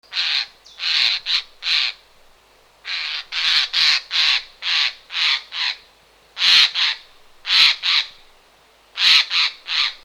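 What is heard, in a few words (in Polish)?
sójka, jay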